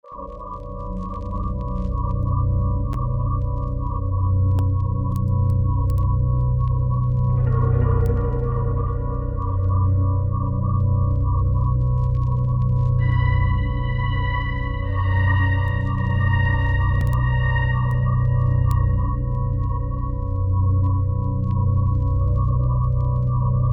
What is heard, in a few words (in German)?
Grusel Ambiente; zweite Grusel-Lesenacht; Deutsch-Französische Gymnasium